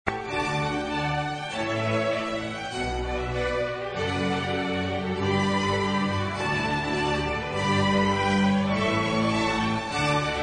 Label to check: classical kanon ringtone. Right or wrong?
Right